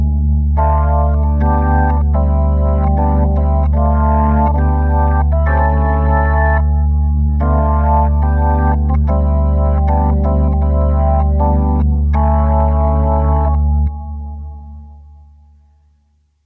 doom intro live

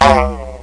BONG

